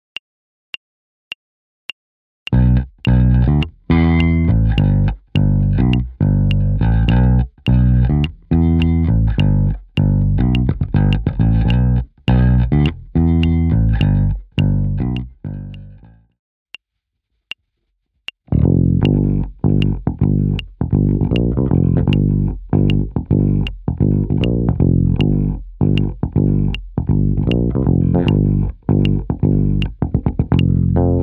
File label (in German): hoerbeispiel bass, Schlagzeug; Bass-Tracks; Musik+Freiheit – Schlagzeug Onlinekurs für musikalisches Spiel